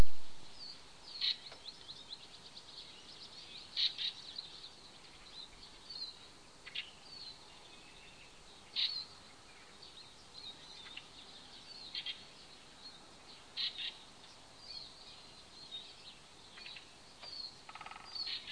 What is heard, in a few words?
woodpecker